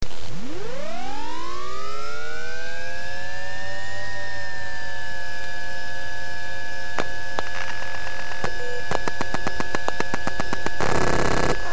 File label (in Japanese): noize test normal